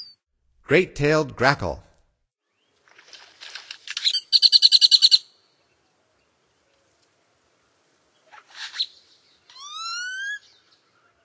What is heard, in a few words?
Great-tailed Grackle, Songs of Birds of North America; Stokes